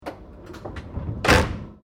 Train JR Regional Aomori Local Door Connecting Close, Japanese Trains